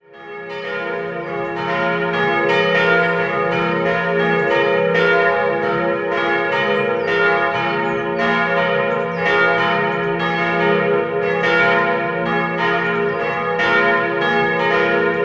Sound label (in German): Fürth-Dambach, Glocken, Erlöserkirche; 1964/65